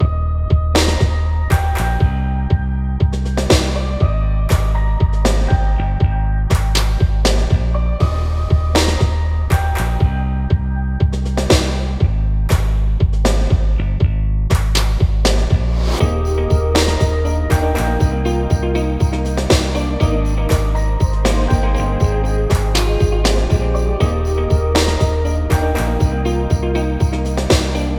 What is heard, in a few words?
Uplifting (Вдохновляющий) трек диско